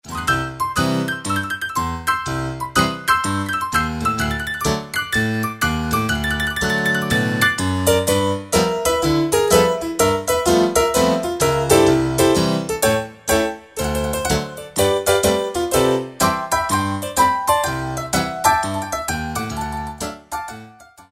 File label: Yamaha TG500, AP Tack